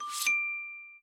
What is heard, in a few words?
block tile flip